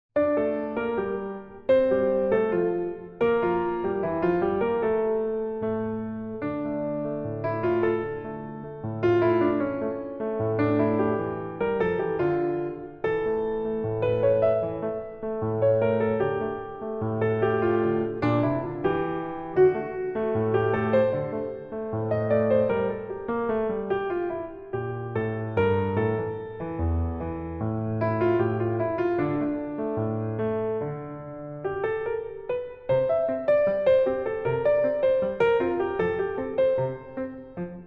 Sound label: ragtime; 小夜曲